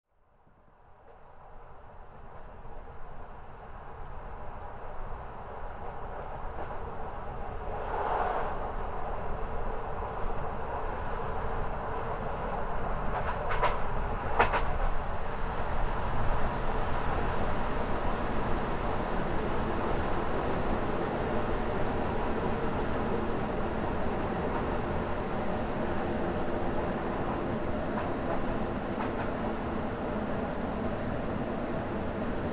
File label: train ride in Poland; Glitterbug